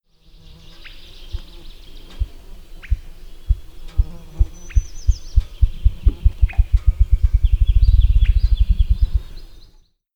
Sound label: Grouse